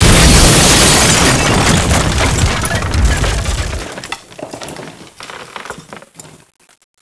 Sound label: crash